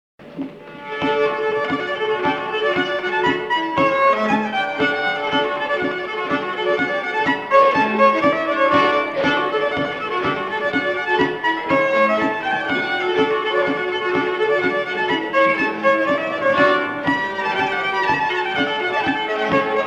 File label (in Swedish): kycklingpolka